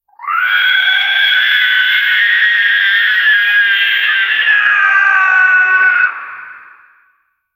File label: distant high pitched dinosaur